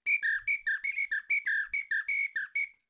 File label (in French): Apito moulin